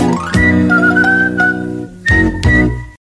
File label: whistling sms ringtone; samsung sms ringtones